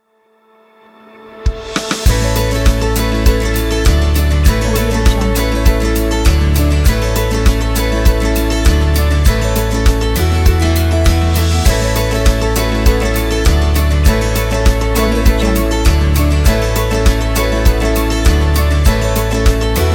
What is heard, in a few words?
Happy Acoustic Logo